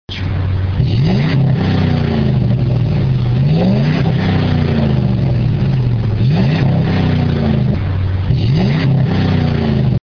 Ram fly by 5.7L Single

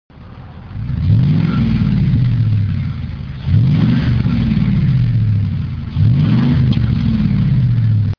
Silverado 4" 8.1L Single

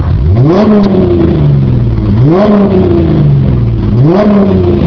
ford mustang 4.0l v6 dual

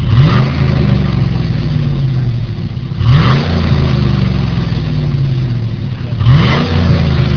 chevy tahoe 5.3l single; Chevy/GMC

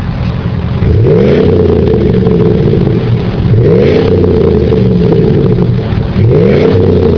chevy suburban 5.3l dual extreme; Chevy/GMC

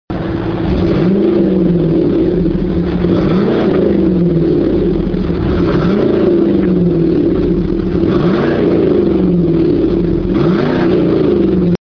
Camaro Z28 - 5.7L Duals

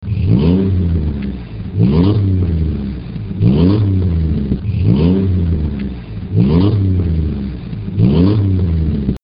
Dakota 3.7L Dual Extreme

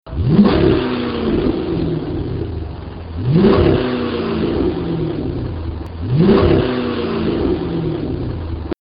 300c 5.7L Split Rear

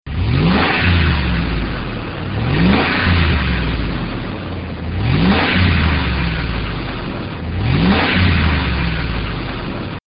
Expedition 5.4L Single

